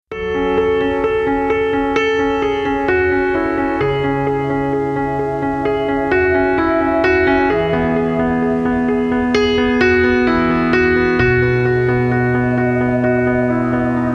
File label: piano